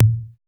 CONGA, TECHNO; Drums In Your FACE!

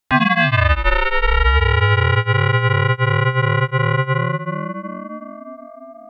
Ring Mod; AMG - Prototype Drum & Bass

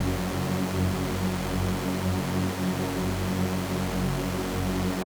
SuperSaw F#3; SuperSaw1; KG MegaSaur; M8

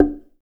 SLIT, ETHNIC DRUMS; Spectrasonics; Liquid Grooves 1 & 2

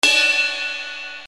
RX7 RIDE CUP, Yamaha RX-7

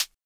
closed HH, Univox SR-95

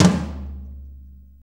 4-4 Tom Flam; 4-4 Drum Loops; Flams; Drum Tools

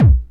KIT HOU; Best Service

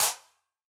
Wider Clap

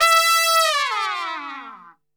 E 4, Brass Super Section, TRP; Best Service; TRP LNGFLL

